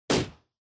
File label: Motion Grab Gentle